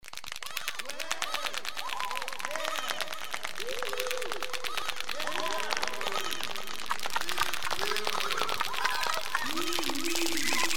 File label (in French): applause le flangé